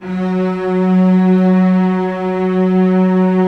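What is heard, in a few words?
celli sustain f#3; Sonatina Symphonic Orchestra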